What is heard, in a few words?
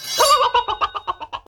Gurubashi Chicken Play